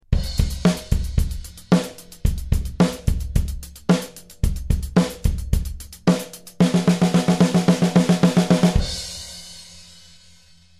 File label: 16th beat